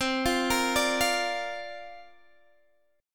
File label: C 7th Suspended 2nd Suspended 4th, C, down, Guitar, Standard; arpeggiated; C7sus2sus4